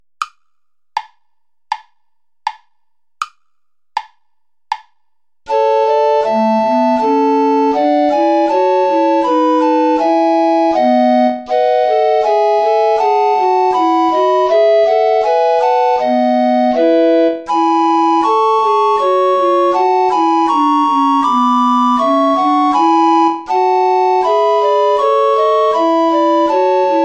play along without tenor recorder; bach johann sebastian; ach gott wie manches herzeleid

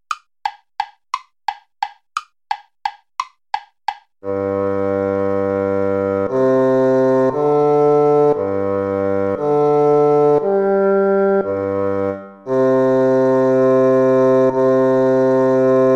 toy play along

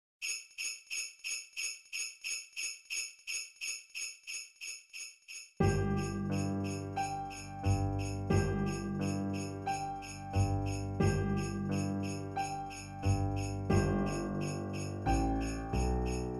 jingle bells

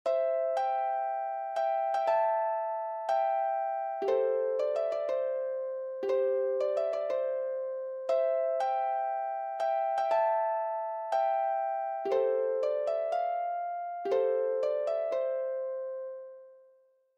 music box melody; eroglu yusuf

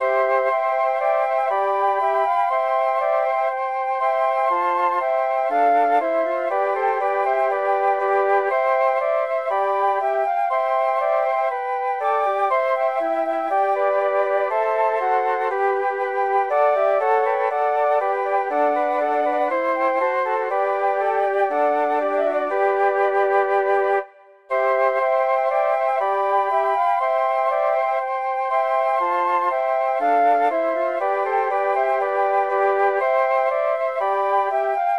march, flute quartet

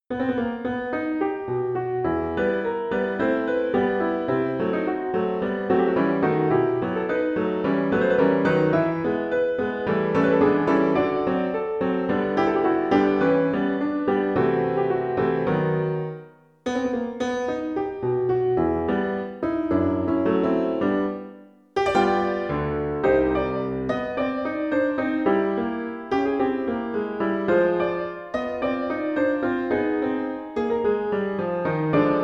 tango; court

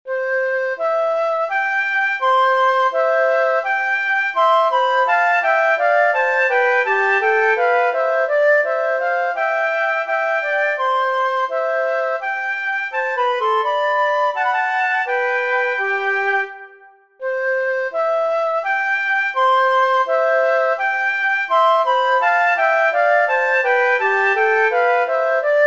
menuet; chedeville esprit philippe; italien the italian woman

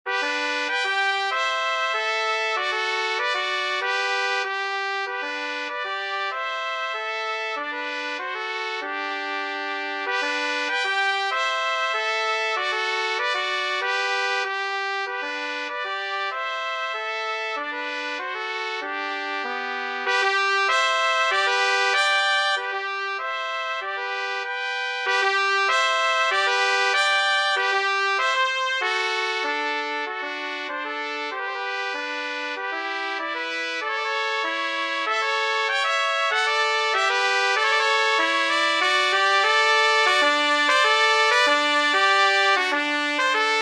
musette; haendel georg friedrich

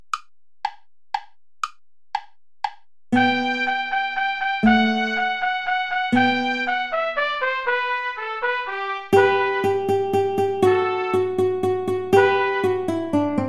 play along without guitar; old hungarian dance

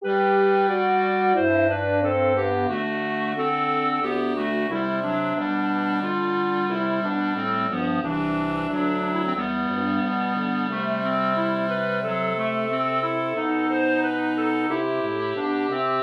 for wind sextet; lemmens jacques nicolas; tota pulchra

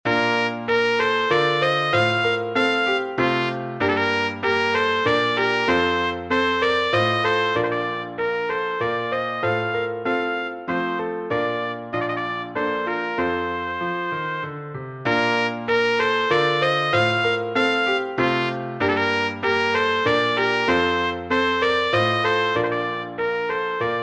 menuet; eccles john

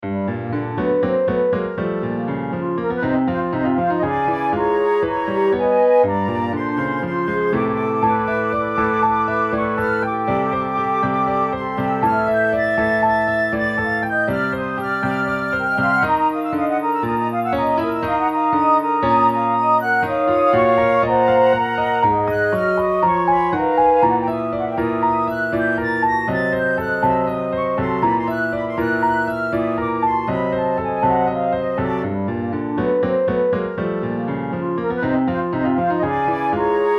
allegro 120

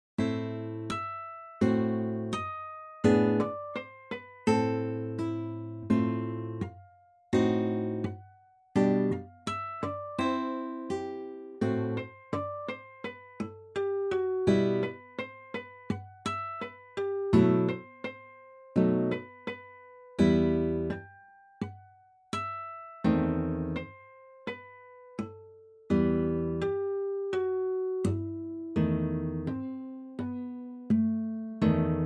flageolet solo; Rung, Frederik

